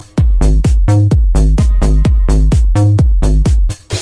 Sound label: Vertu Message, ringtones